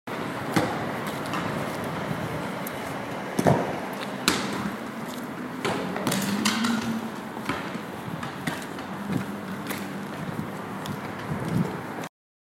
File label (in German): ballspielen